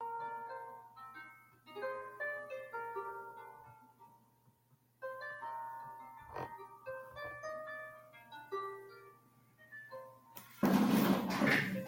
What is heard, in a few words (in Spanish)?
Hermosa Musica Celta Con Arpa para Relajarse